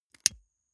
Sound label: lighter electric switch flame on gas